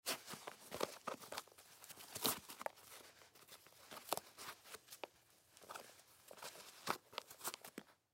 Shoes Tie Laces; Foley; Objects; Blastwave

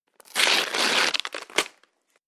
Ripping Paper Bag; Ripping Sound Effects; Foley Sound Effect

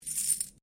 Coin Penny Multiple Move Hand; Common coins; Money; Objects

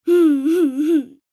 Pout Short Male Seven Year Old Boy; Mouth; Human; Blastwave

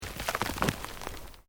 Earth Loose Move Short; Material components for constructing more complex sounds; Debris; Materials; SoundEffects Foundation2

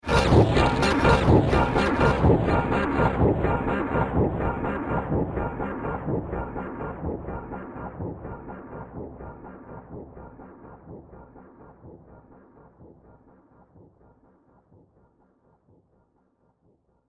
Rhythmic Industrial Noise; horror sound effect; Andrew Fleming

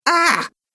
Male Voice "Ahh"; Recorded Voice Sound Effects

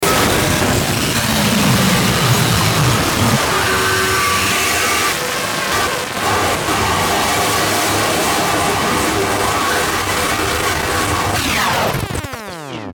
Distortion Long Glitch Power Down; Blastwave

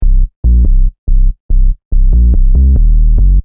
Techno Trance Sub Bass Loop In C 142 Bpm

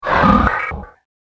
Enter The Sf Chamber; Sci Fi Music; science fiction sound effect; star wars / trek style games; Andrew Fleming

